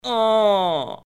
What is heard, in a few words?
death